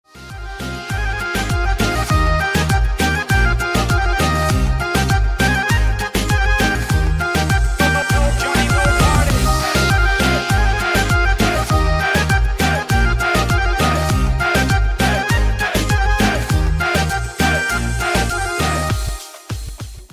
Balkan Remix

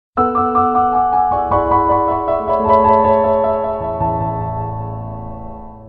Nokia Echo Tune

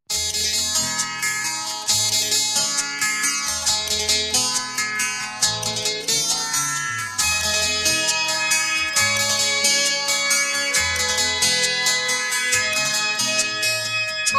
Nokia Lumia

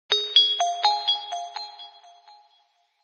Apple Ripple Notify

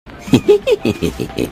Clank laugh

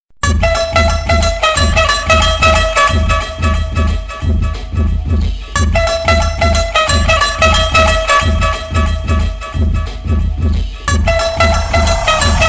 Arabic Tune

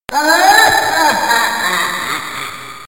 Laughter

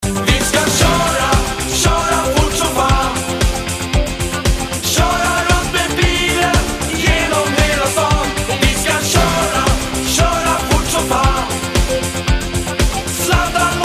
Kora

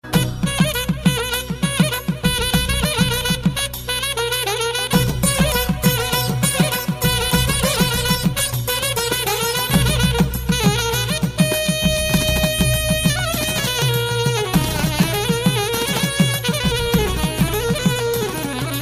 Saxophone

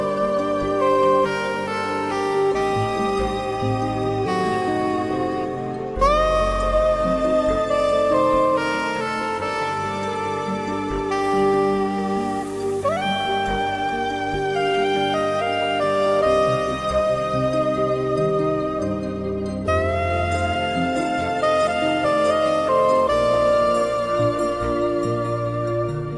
adagio

